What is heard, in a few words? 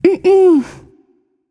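nonverbal disagree; smite; voicepack; izanami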